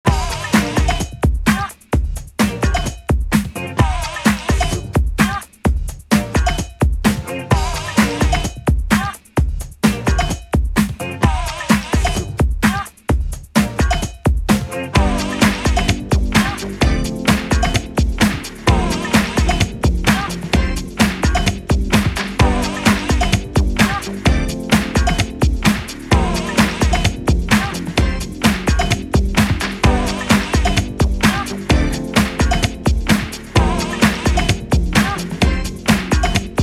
quartet

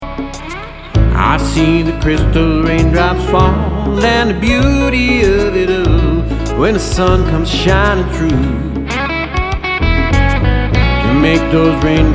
ballad n blues

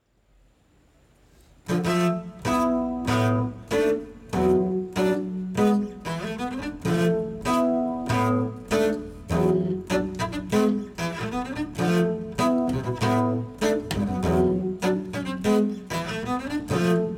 Foley Soul Bass Fugue

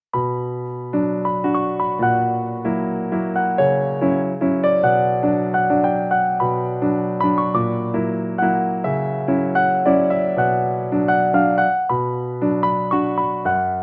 Classical Piano Waltz Cue

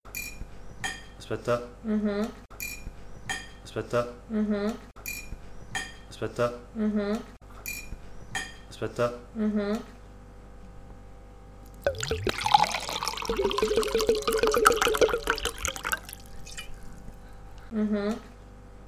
WATER LOOP

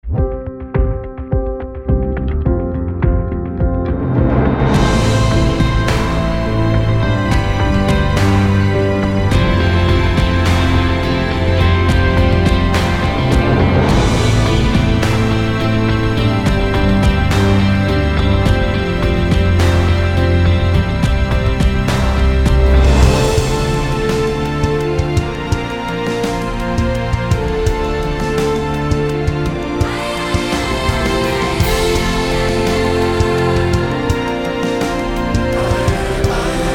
Big Orchestral

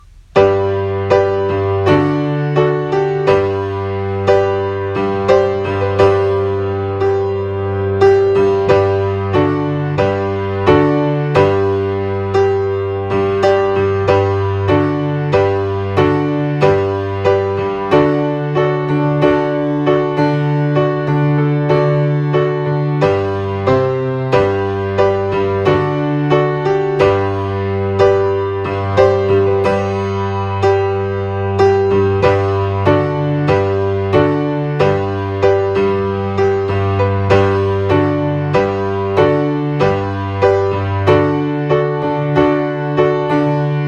O Come Let Us Adore Him Instrumental

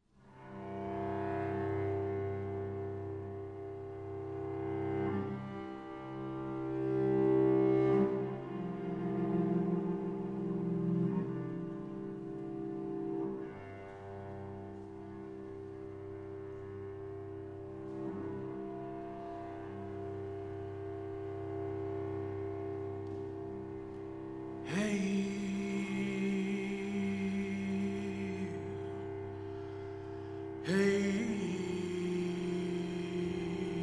Birdsong At Dusk

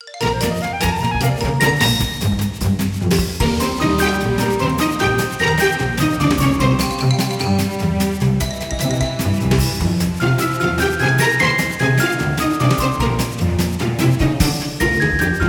Swing

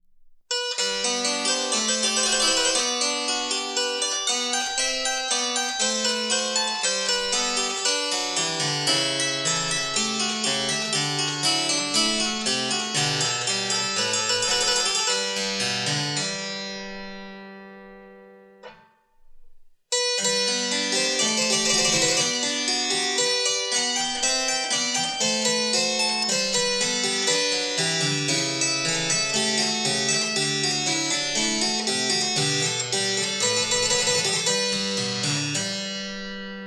G major French Suite Allemande phrases; J S Bach; Music for Two Keyboards

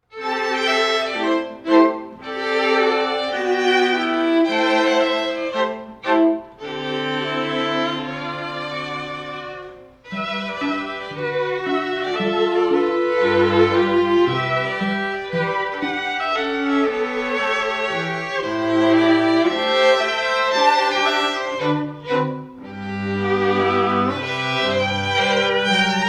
Strings; Gershwin; Quartet

